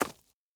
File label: stag footstep dirt hard; Rust